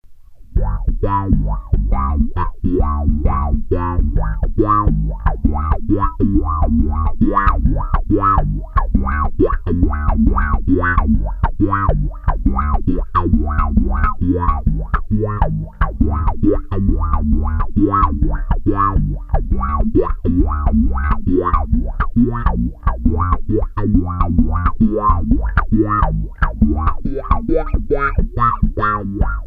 Funky Wah; EBS

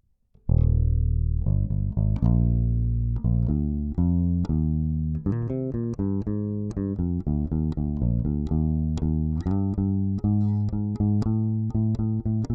Spector Both Pickups

